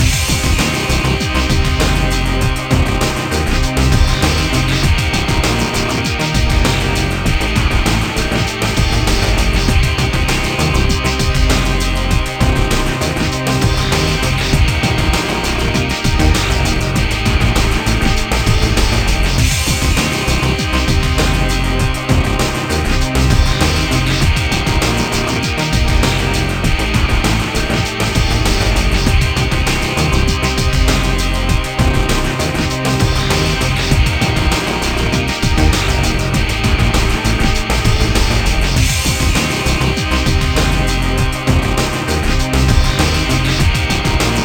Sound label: Win; Quake III Arena